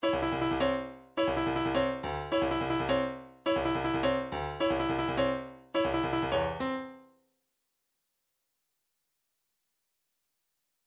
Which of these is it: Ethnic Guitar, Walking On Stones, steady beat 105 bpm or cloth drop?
steady beat 105 bpm